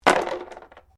dice medium cardboard box throw; Variety of dice sounds; Casinos

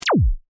Laser Gun Fire, Shot; Cannon; Science, Fiction; Laser Sound Effects; BlastWave

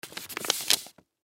Clasp Envelope Fold Top Flap Close; Paper; Office; 9 X 12; Blastwave

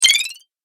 Classic Spell, magic sound effects

